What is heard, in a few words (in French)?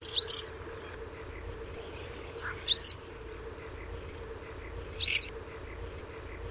bergeronnette printaniere